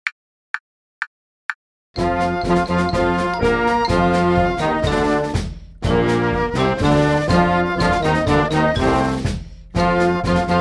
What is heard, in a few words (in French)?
vive la Canadienne noire à 126, accompagnements